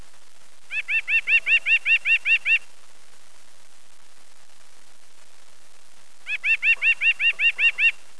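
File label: White-breasted Nuthatch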